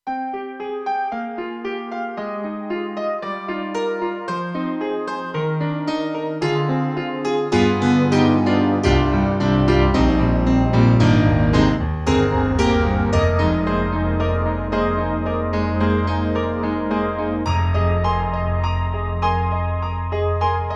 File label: KORG Soft Piano Stack, TRITON-Rack; EXB-PCM08